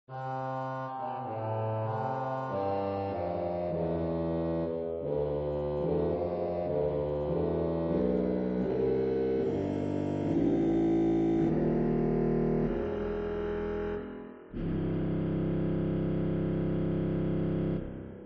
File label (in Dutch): bazuin